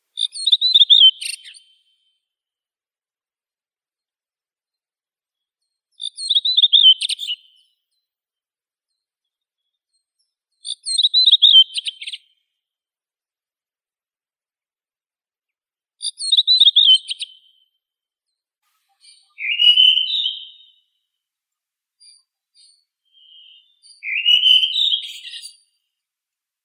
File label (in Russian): drozd belobrovik turdus iliacus